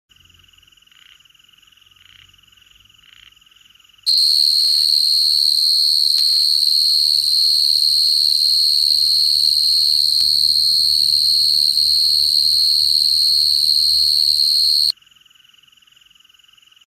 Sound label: Crickets in summer